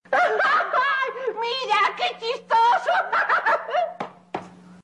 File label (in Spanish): risa; kiko mira que chistoso